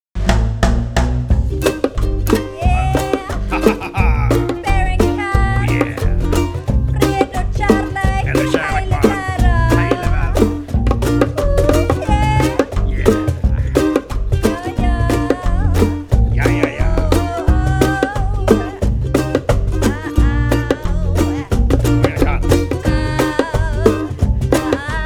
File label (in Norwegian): reggaeorkester